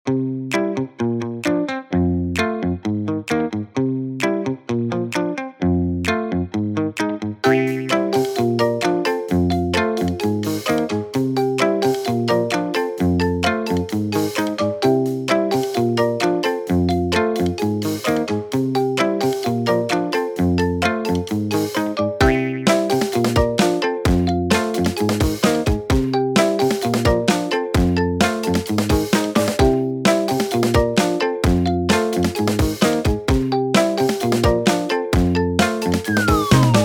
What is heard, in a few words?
game gaming music; hitslab; treasure trails